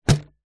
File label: office felt tip pens pencils other stationery in plastic tub set down wood surface; zapsplat